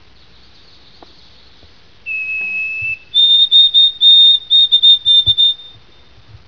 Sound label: white-throated sparrow